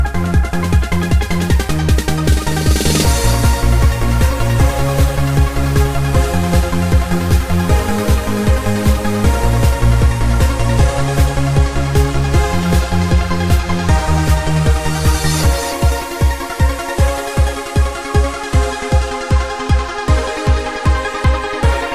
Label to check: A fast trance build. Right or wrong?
Right